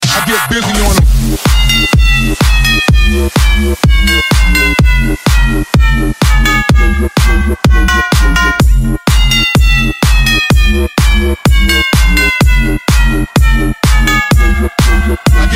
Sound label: bass